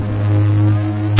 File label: electrical hum; ambience